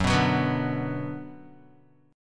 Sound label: Gb Suspended 4th Sharp 5th, Guitar; strummed; 2,2,4,4,2,x; 4E,4A,5D,5G,5C,6F